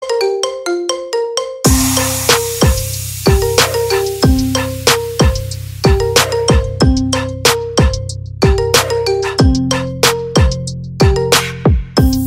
iphone 12 pro

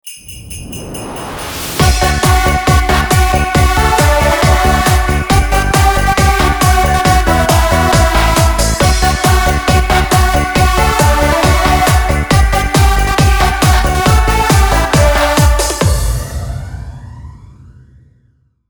jinglebells dance

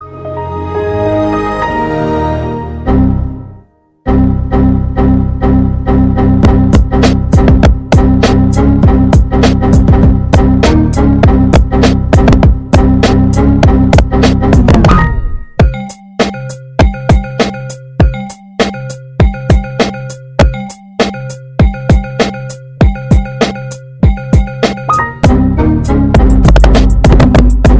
error remix

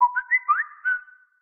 whatsapp samsung

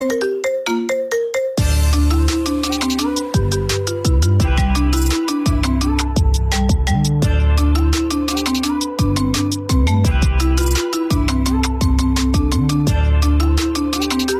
iphone 15 pro

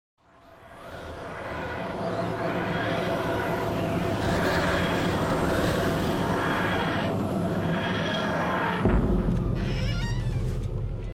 doctor who tardis; doctor who der nachste arzt tardis land

